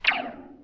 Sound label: alien shoot; Space Shoot